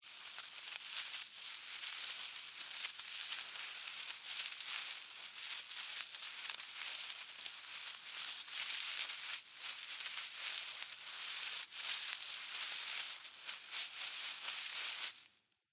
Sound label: Animal Critter Movement Grass Foliage; Miscellaneous Animal